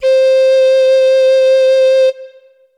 Instrument samples; peppa pig world adventures

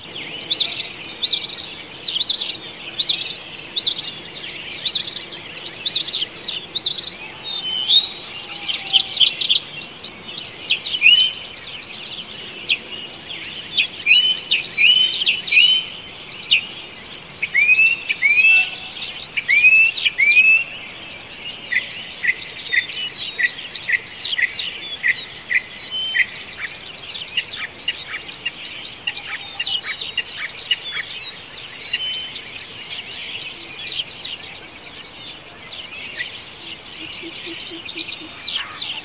ambience country dawn; American Fugitive

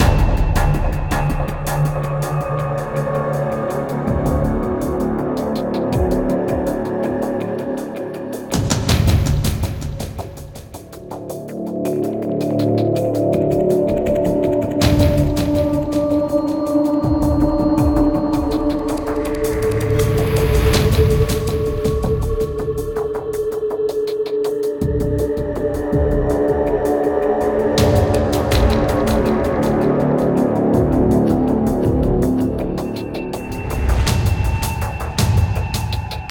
Combat Mixed

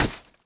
dirt hit; teardown ps5 windows xbox series xs gamerip 2022

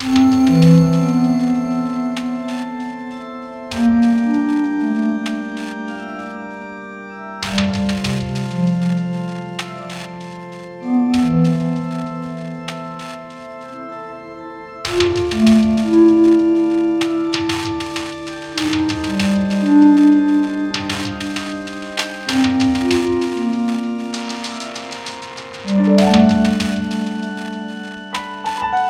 Speech Dark C; SanctuaryRPG

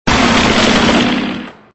Smash Machine

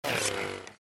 Slow; Troll Face Quest Unlucky; Android

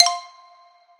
countdown

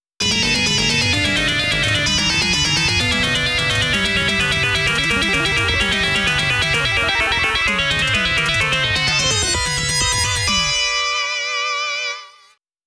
STAGE VIII BOSS; ROM Cassette Disc In NAMCO BANDAI Games Inc. Vol.1; スプラッターハウス PART2

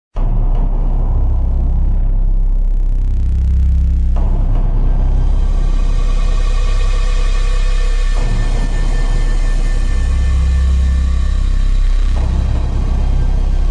Tension; Carnivores Cityscape

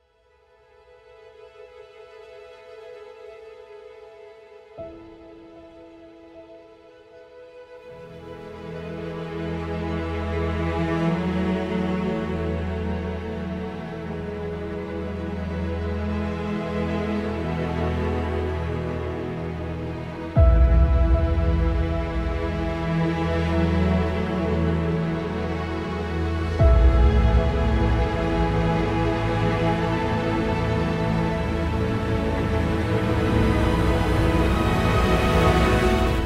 Trailer Music; Microsoft Flight Simulator; Xbox Series X|S

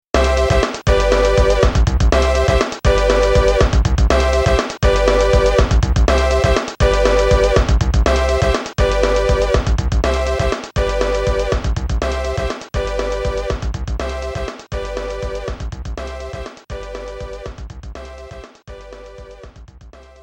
Party Mode Victory; へべれけのおいしいパズルはいりませんか

